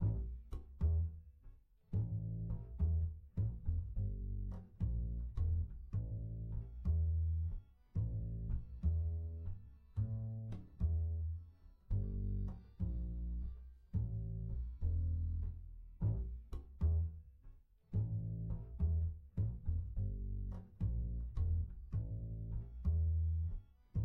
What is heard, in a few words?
Cello